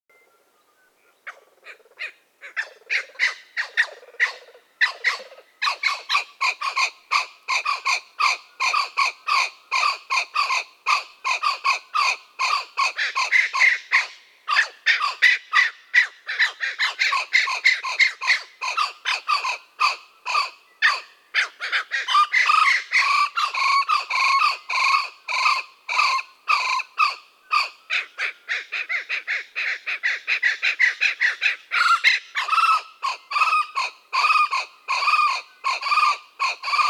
blue winged kookaburra

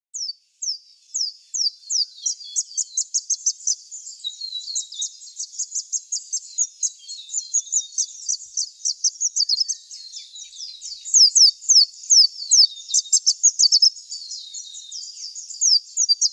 scarlet honeyeater

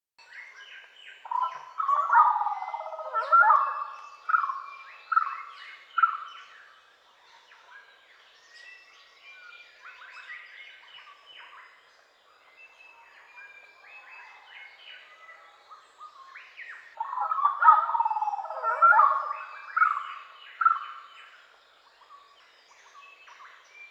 orange footed scrubfowl; Bird; Australian

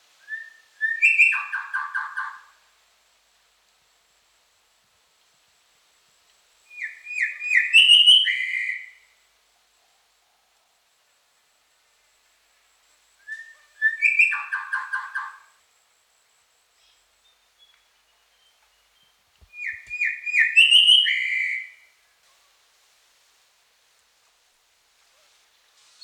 sandstone shrike thrush

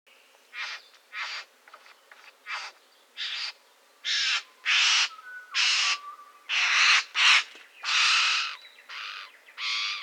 satin bowerbird

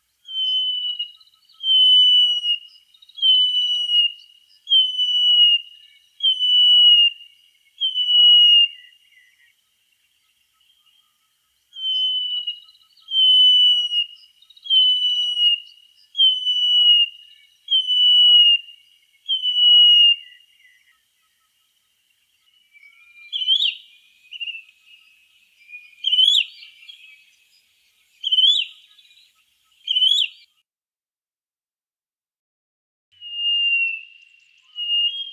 black eared cuckoo; Bird

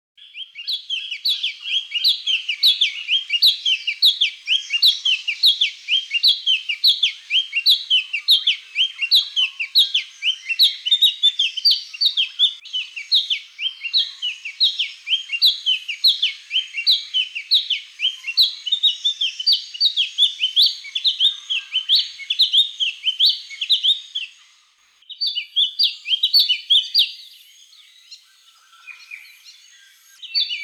fairy gerygone

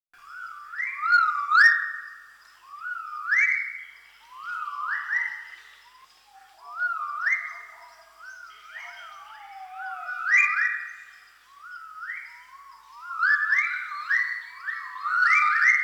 magnificent riflebird

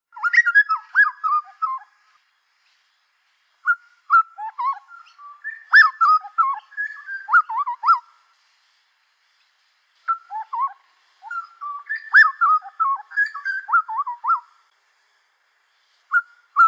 grey butcherbird

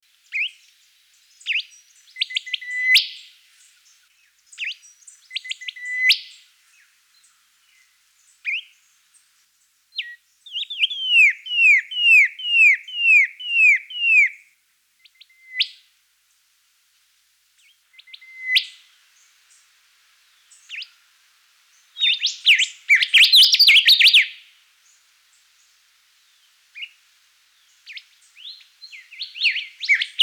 rufous whistler

